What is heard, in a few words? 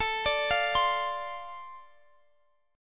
D-Minor 7th A, chord